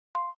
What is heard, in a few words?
Video Stop, ui; FCNT Arrows Alpha